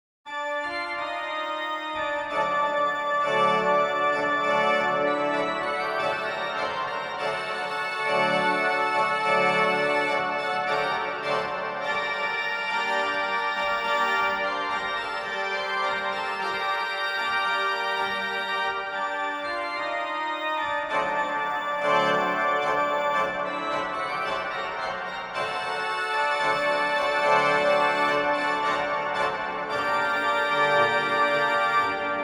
organ as accordion; Organteq 2

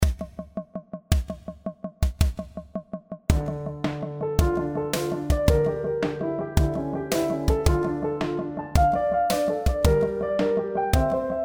Practising BACH

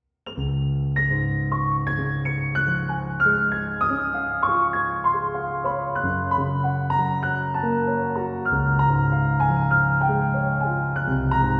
Schumann Symphonic Etude Var5

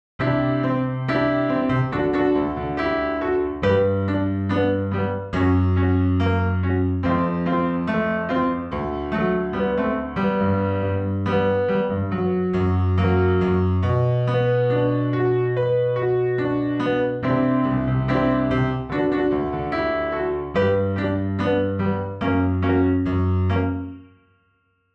Piano

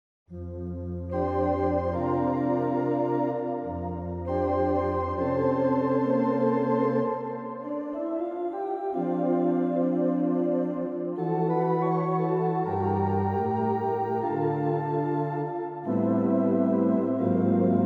The singing tremulant

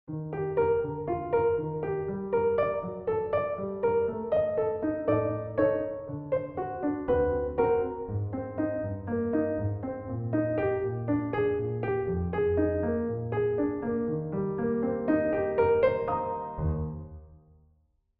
Piano

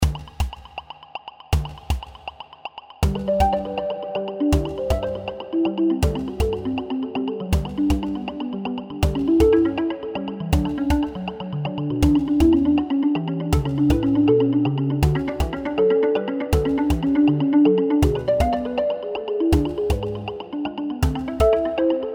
Practising BACH

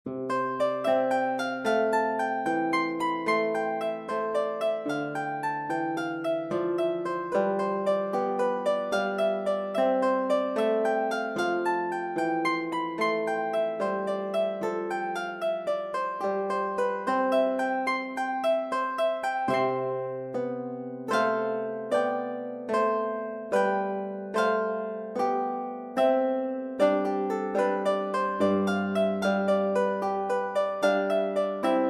Triple Harp Jesu Joy of Man s Desiring; BACH

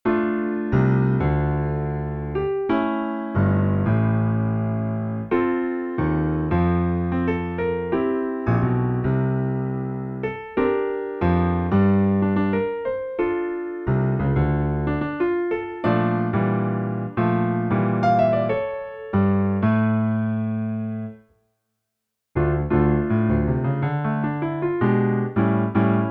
Sarabanda Rock

